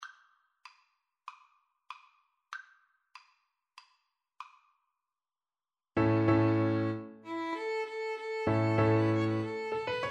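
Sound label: violin piano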